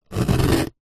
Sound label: cardboard box score with sharp knife; foley